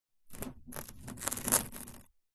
foley cardboard box small move slide slightly gritty ground; zapsplat